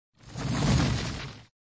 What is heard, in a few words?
Sound Roll effect